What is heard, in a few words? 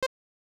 Toggle Option; Space Invaders; Super Game Boy; SNES